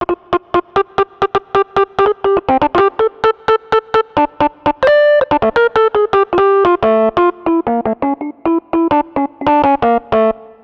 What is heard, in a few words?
Rhodes Solo, Cinematic Funk; Sony Creative Software; Urban Tone Poems